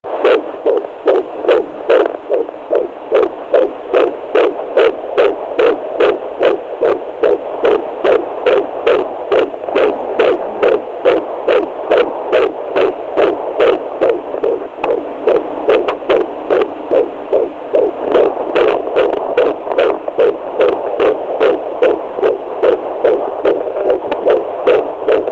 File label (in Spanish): latido